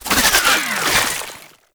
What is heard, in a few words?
Infested Spore Pistol Mech React; Weapons